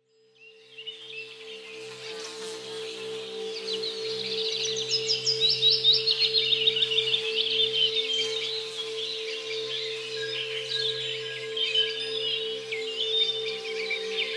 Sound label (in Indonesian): terapi brainwave